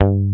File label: BAS; Best Service Gigapack 2